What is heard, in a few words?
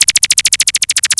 scifi, SCIFI & SWEEPS; Techno Trance Essentials